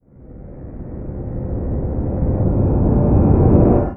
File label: start fall